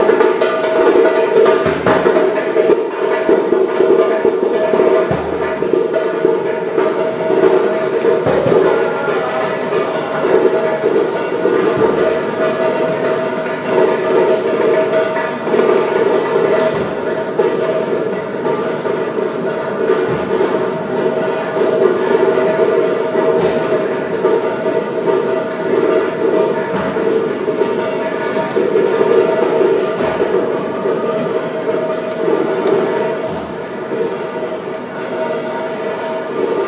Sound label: djembe busker at angel station